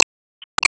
Enemy Bossegg Move

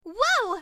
Falling

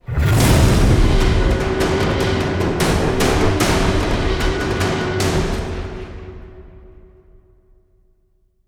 Stinger Fill

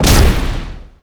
Enemy Tail Armor Brake

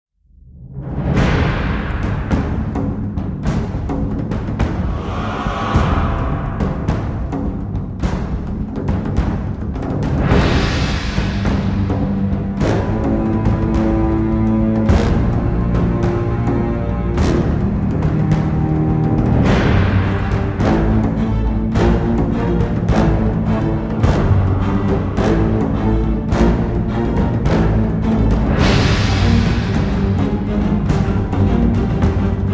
combat opener

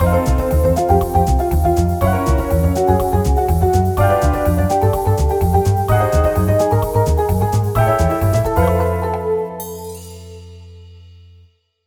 Continue; Sonic Robo Blast 2.2

